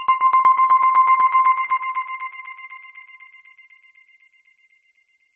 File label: Bells